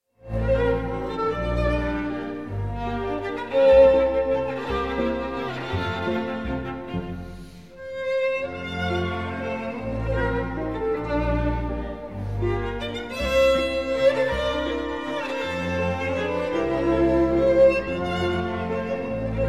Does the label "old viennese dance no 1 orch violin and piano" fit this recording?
Yes